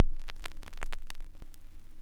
X CRCK R, RECORD-FX; XP RECORD-FX; Best Service; XXL Production Effects

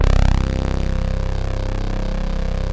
SYNHORN C; Greytsounds; Scott Peers Sound Engineering

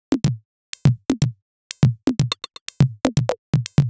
Top B 123bpm, House Drumloops; Zero-G House Fabrik Drumloops

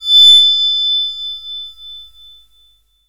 Fine Whine; Zero-G; Total Drum Bass; Hits and Bits

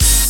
NEU HIT, ORCHESTRA HIT; Crypton - DrumBase5000

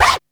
SCRATCH, VINYL FX; Back In Time Records; Big Bang

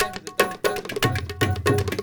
India, Heart of Asia

